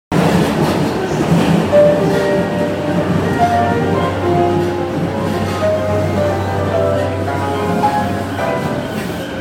JR山手線新宿CCW

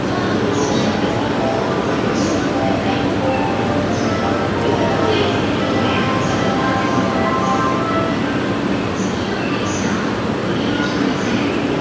JR京浜東北線浜松町北, train sounds

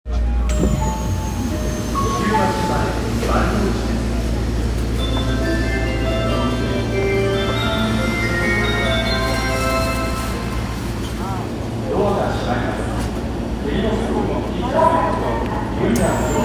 千代田線二重橋前東

